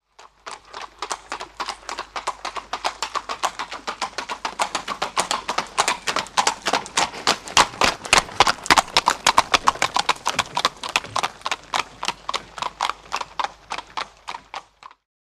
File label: By Horse Carriage Up P